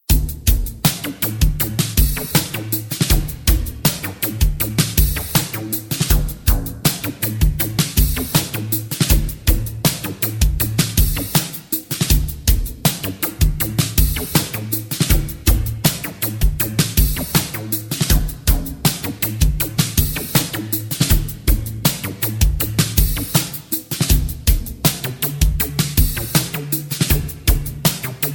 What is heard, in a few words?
No Backing Vocals